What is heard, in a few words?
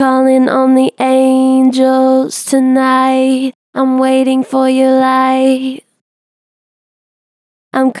Gentle Vibrato "Callin on the angels tonight", Vocals, Natural Vibrato Gentle; Harmor Vocals